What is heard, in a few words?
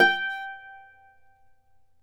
Celtic Harp